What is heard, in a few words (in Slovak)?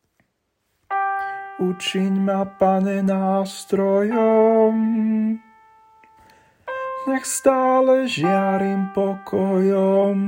Sopran, song